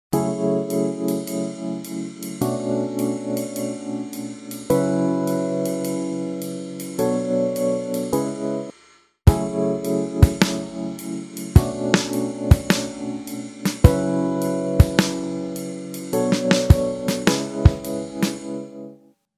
drum ride cymbal

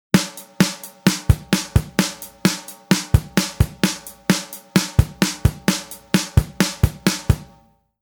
drum 8beat; ドラムの基本といえば、8ビートと16ビート。ドラム初心者が最初に教わるドラムパターンです。

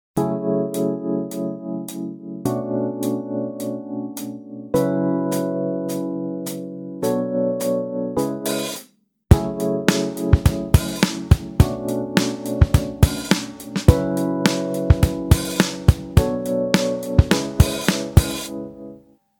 drum hihat

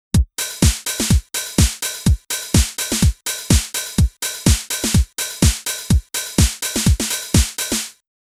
drum house; ハウスミュージック; ダンスミュージックの王道にして覇道といえばハウスミュージック（個人の意見）。

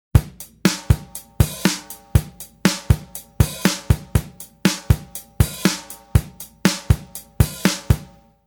drum 8beat; ドラムの基本といえば、8ビートと16ビート。ドラム初心者が最初に教わるドラムパターンです。

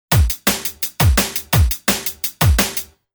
drum 8beat sample

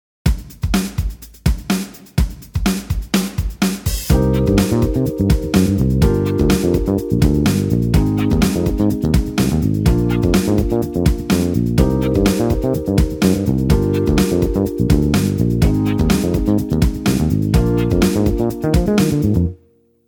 bass 16beat

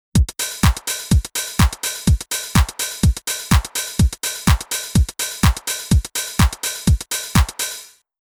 drum house; ハウスミュージック; ダンスミュージックの王道にして覇道といえばハウスミュージック（個人の意見）。

